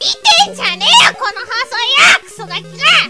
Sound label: kid